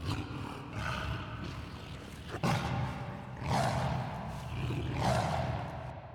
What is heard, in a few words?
snork tunnel